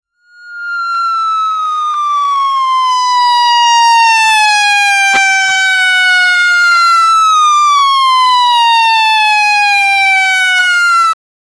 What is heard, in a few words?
criminals sound